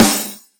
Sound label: soft hit normal; Azer+ 2023; defaultish